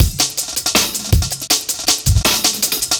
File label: Beat, Drum N Bass